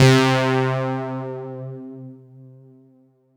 Roland JV 2080, interval; MG INTERVAL

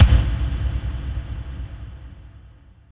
KICK, Tekno Industrial; East West

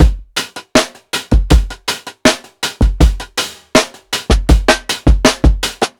PICCOLO 80 16TH BEAT, DRUMS LOOPS; BASEMENT DRUMS VOL 2

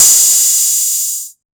Hat Open, 606; classic machines

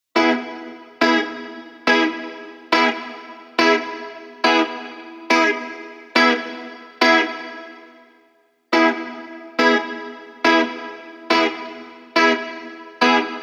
140BPM Emaj Guitar FX, Offbeat Dub Guitars; Antidote Advent

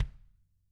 Tama kick; ILIO; Double Platinum Drums 2

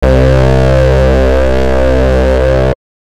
Bass Note C; House; Best Service; House Definition VOL-1; Basses

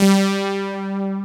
POLY KEYS; Pro-Rec - Synthsonic

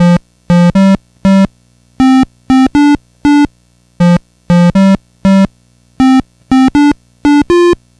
Atari 2600 Bass, chiptune

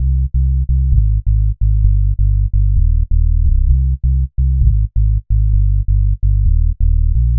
NRG Bass, Trance

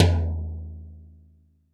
TD-20 Tom A, Roland TD-20 Toms

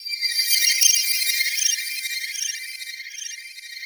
ELVES, PIXIES; Spectrasonic Distorted Reality 1

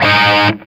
SOLDANO ROCK, Guitars

